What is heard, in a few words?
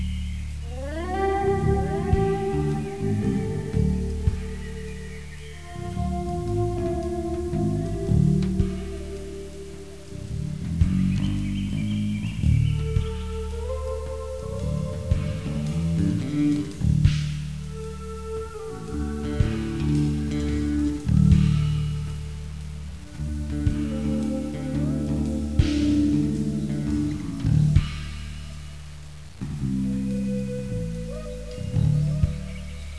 JAZZ